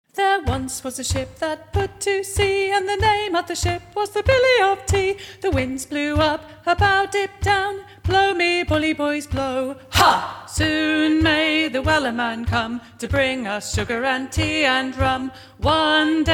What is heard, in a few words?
voice with verse harmony